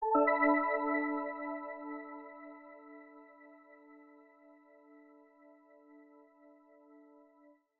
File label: Chime One Shot; Frutiger Aero